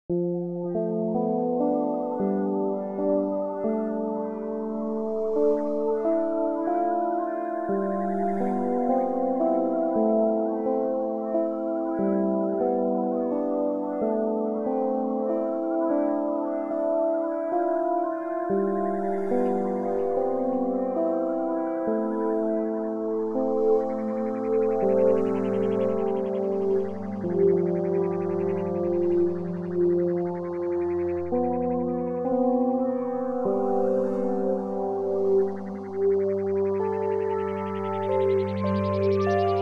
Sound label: FL improvization